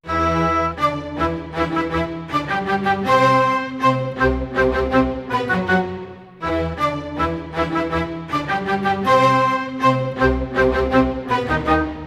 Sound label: 90 e min full mix, Orchestral arrangements